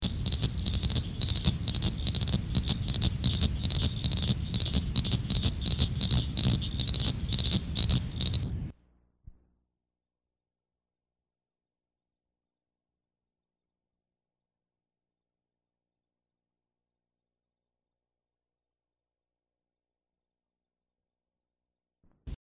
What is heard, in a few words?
Cricket Frog; wildlife